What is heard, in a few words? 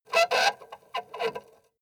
treasure handle squeak